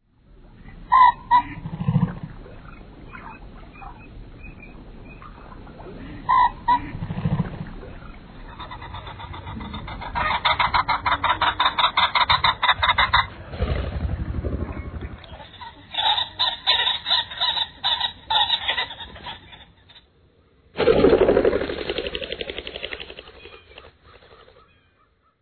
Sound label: Ringed neck Pheasant; Bird Calls; Envirothon Jr. High Resources; Jr. High Enviro Wildlife Resource Packet